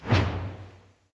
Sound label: swing slow; smite; god odin